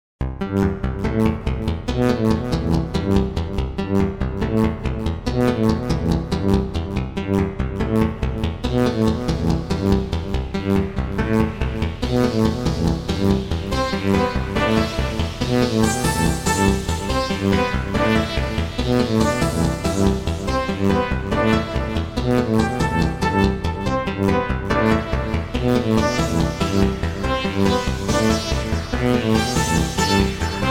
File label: washy arpeggio